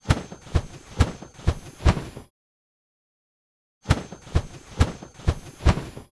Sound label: Pegasus wings; Seer; GamigoNA